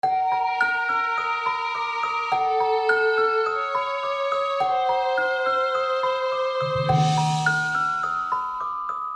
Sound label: Short Gothic